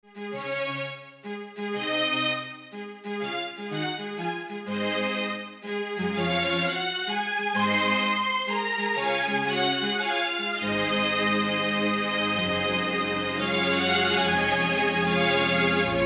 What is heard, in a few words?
improvisation